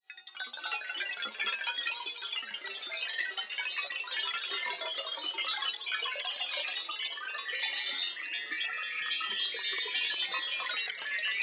Trilling

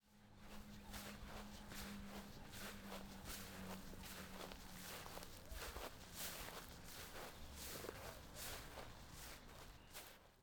Women Walking On Grass Outside With Sneakers

